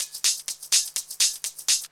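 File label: drum loop 125, hh+filterloops; Vocal House; PS-28 Vocal House Samples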